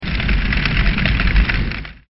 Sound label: torch